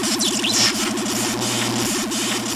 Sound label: Healing Sound